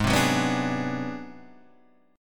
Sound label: Ab 7th Sharp 9th Flat 5th Guitar Standard, chord; Ab7#9b5 strummed; JGuitar